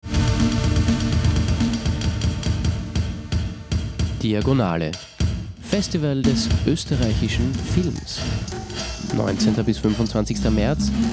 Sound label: jingle